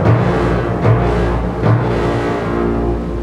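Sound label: A#3 orchestra hit; WIZARD SYMPHONY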